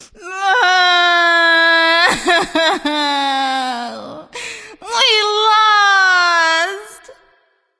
boo hoo; daji

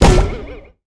Hit; Smite; god Kumbhakarna

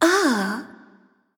surprised; smite; nox

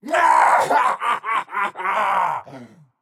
laugh; smite; zeus

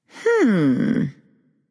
NV Thinking; smite; awilix

